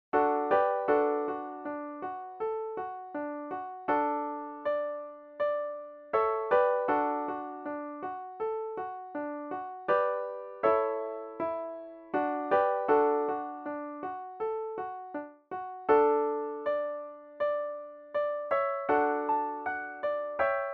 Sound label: Soldiers Joy melody